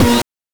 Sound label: BANK2 SFX, NES DPCM; MIDINES